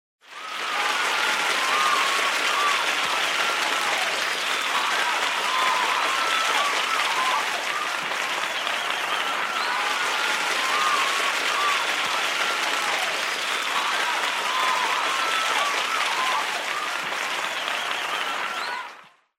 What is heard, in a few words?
applause